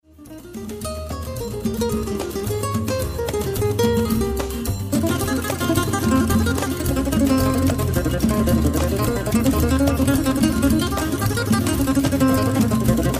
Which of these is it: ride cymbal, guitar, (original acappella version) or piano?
guitar